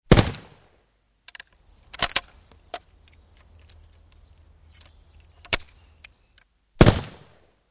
Musket Fire, Two musket shots with cocking loading gun; Rifle Sound Effects